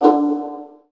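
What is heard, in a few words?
viola pizzicato D2 right, Virtuoso Strings; ILIO; Kirk Hunter Virtuoso Strings VOL-2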